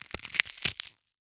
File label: Horror Scary Jaw Ripping Off